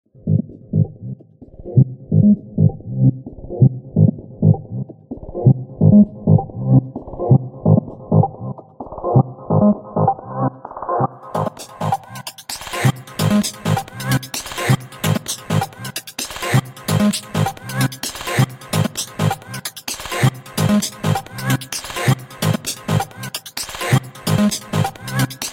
beat

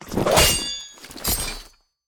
Monster; Zenless Zone Zero

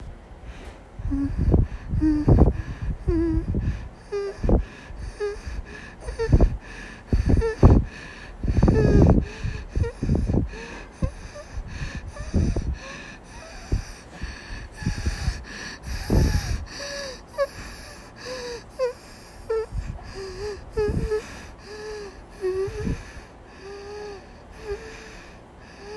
Mini Moans

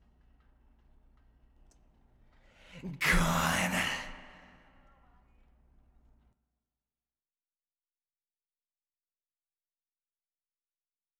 Supporting Verse Vocal

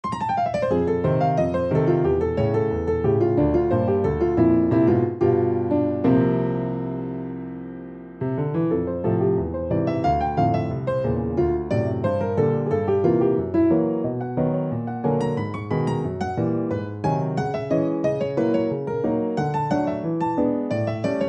Piano; for WIP Game

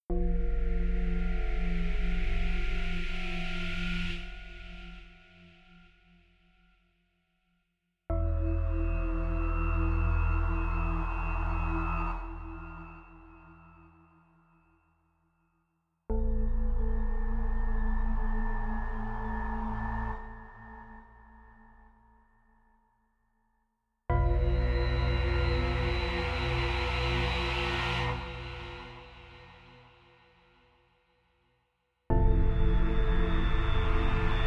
dabbling with midi keyboard

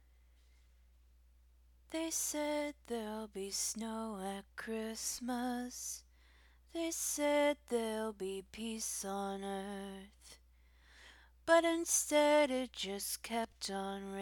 Acappella

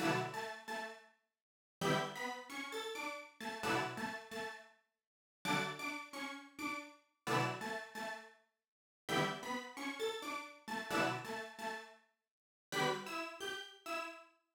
High pitch synth; 130BPM; stems; Pony Fan Music Remix Stems

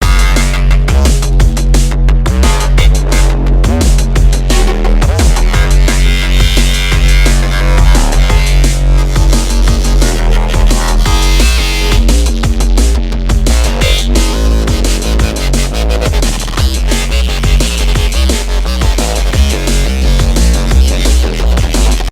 Wub A Dub Dub; The Lost Sound

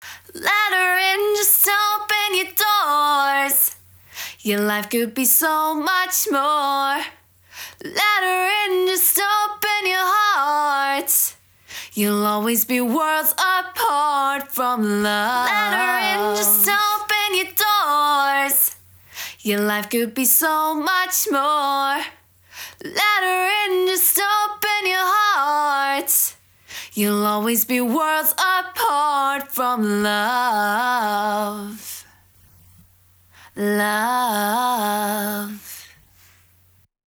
chorus, 128BPM; Stems

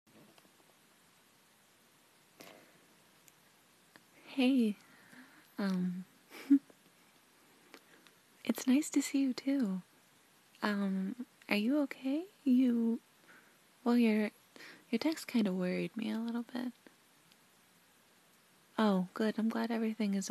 F4F Super Sweet Breathy and Whispery Lady Noises; Can I Try; F4F First Time

